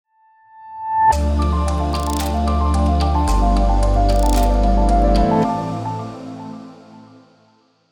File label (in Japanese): サウンドロゴ